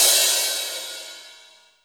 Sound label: Cym 20' Bronze, Drum Machines Alesis D4